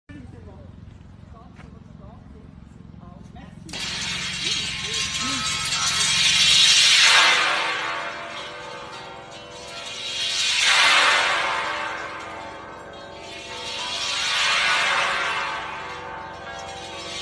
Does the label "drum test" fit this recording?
No